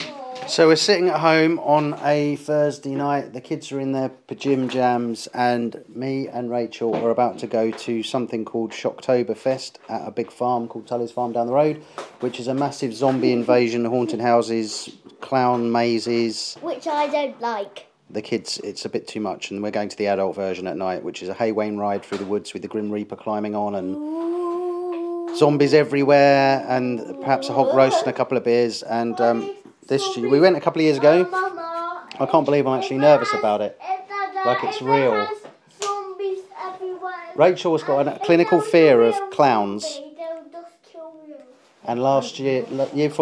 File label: a scream